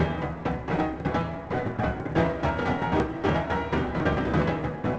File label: swing jazz